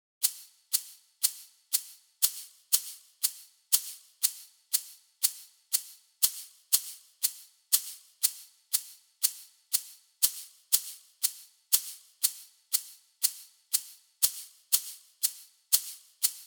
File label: Kit